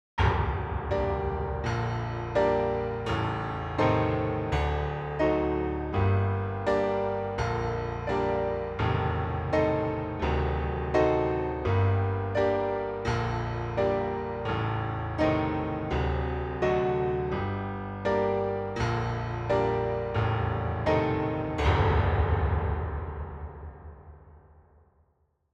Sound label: Mini Grand, piano